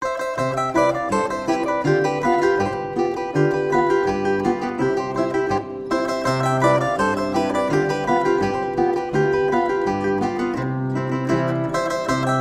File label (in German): Zillertaler Boarischer, Zither